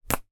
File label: sport table tennis ping pong ball catch in hand